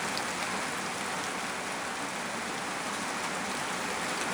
rain; Soldier Of Fortune II Double Helix; XBOX; ambience; prague

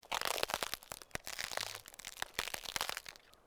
ice; ambience; Soldier Of Fortune II Double Helix; XBOX; kamchatka

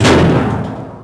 metal drum hit; bouncyobjects; Tony Hawk's Project 8; XBOX

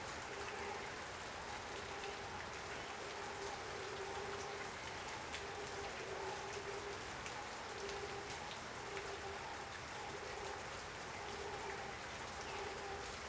amb swamp Rain; Star Wars Obi Wan; XBOX

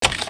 Interface Mouse Over

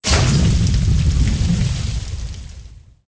aquarium; Soldier Of Fortune II Double Helix; XBOX

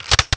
pump, weapons m590; Soldier Of Fortune II Double Helix; XBOX

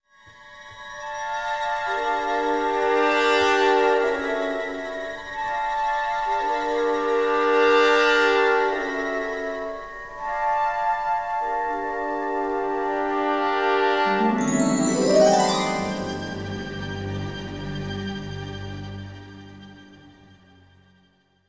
music flourish, intro

